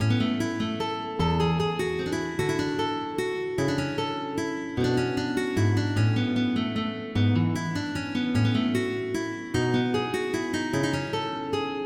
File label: Menuett for guitar, MIDI